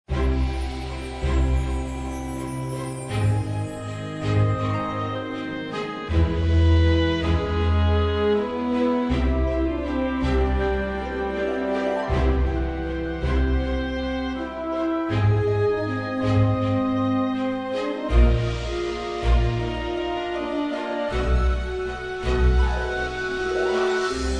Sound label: bgm street; Soul Crash; Android